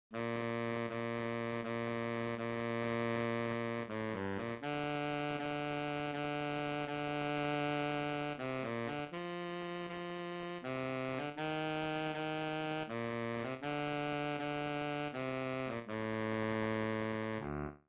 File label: bari sax